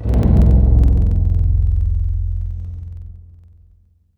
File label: Cello Stct, Wavetables; Synthetic's Serum Collection; Standalone-Music SYMPHONY Wavetables